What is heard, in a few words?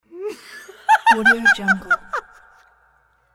woman insane laughter; fxprosound